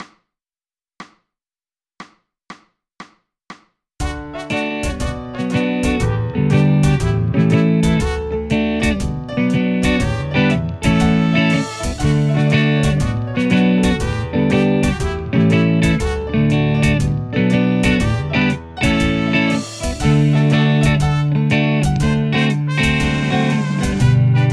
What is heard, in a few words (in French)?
chanson instrumental